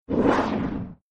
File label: enemy spit sound; Arc of Templar